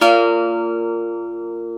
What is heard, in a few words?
SAZ, Plucks; Eth Plucks, Pluck Duo